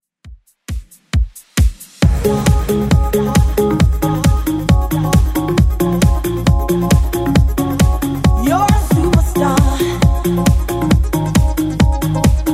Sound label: 90s Redrum 135bpm